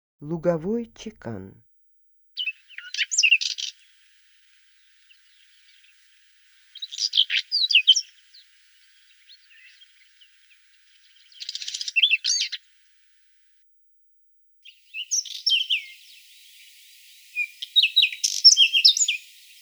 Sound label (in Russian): lugovoy chekan golos